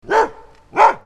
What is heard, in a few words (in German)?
bellen hund zweimal; salamisound